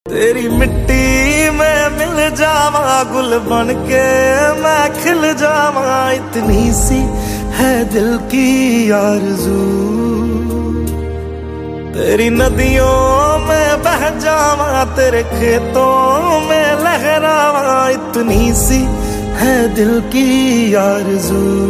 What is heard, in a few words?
Desh Bhakti Ringtone